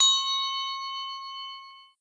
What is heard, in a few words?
bell quarter, clock sound